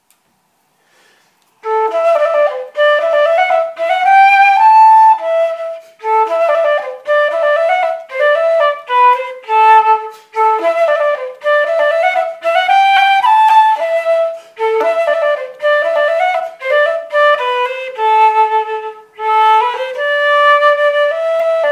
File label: celtic tunes